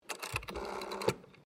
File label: TELEPHONE WOODEN AUTOMATIC DIALING WALLPHONE DIAL; Various old telephones; Electronics